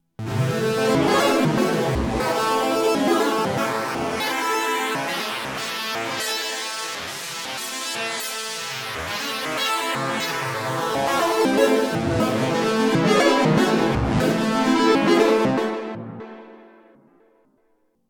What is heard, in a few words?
Erica Synths Black Hole DSP2 Synth crush CV